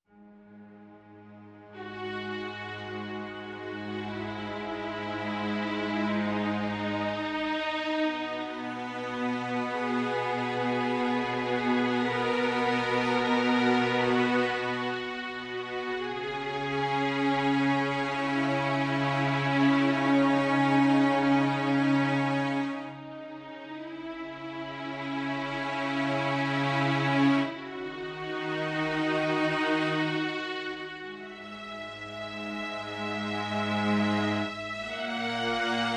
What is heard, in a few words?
Upper Strings